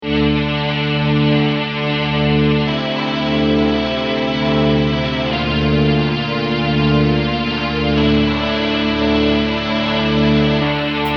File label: Rock Lead